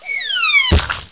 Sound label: boomerang catch; firefly3; austrlia